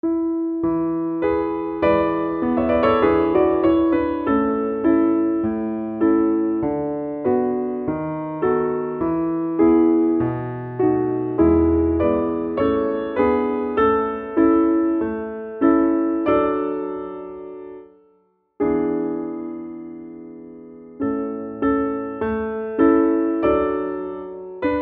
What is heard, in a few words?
medium tempo piano accompaniment; Mozart; La ci darem la mano